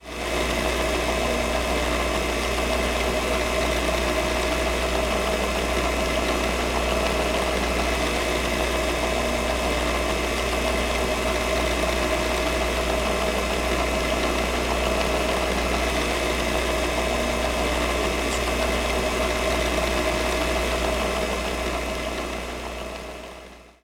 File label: Conveyor Belt; Industrial / Machinery